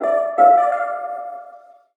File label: tonal oneshot Am; Pop; Slate; PASADENA Pop Sample Pack; Tonal One Shots